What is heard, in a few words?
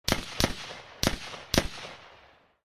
M16 firing distant perspective